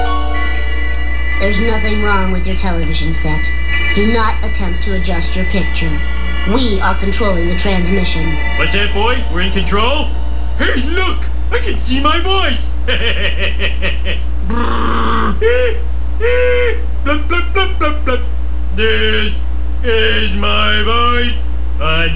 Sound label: BLUBBLUB